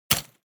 medieval step armor halfplate; foley; smartsoundfx

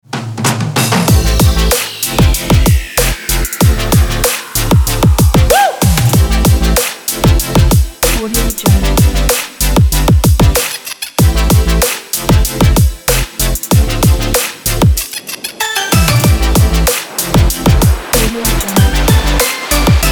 future bass

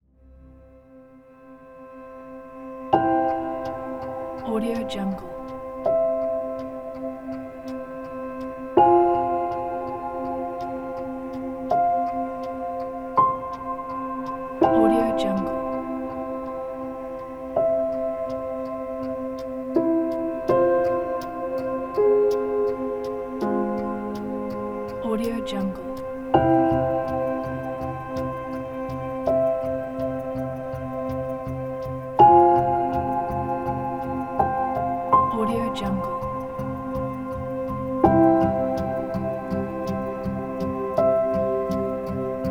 solemn winter

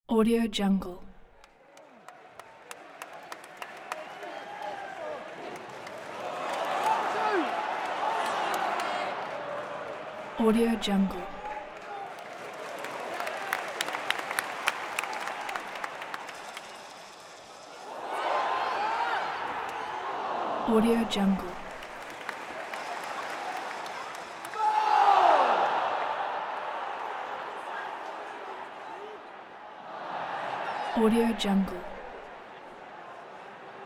stadium crowd sound effect

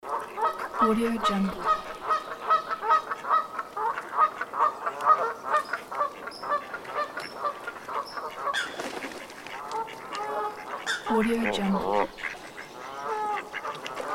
waterfowl ambience loop

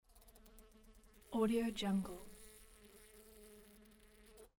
bee single buzz

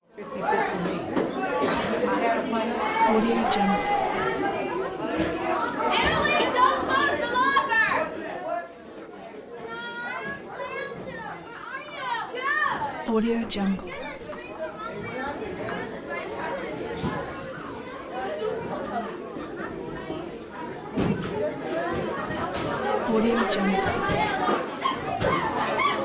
school walla jr high kids leaving locker bangs

